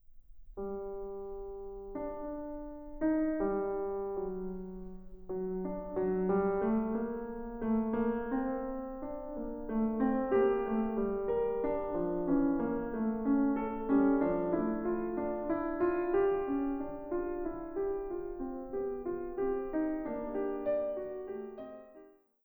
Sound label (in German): Fuge für Klavier in g moll; Deduktion und Fuge für Klavier in g moll; pianostukken van Wilhelm Berger